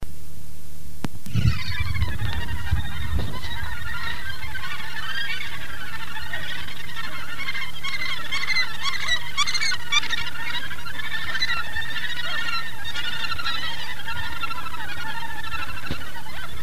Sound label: Gannet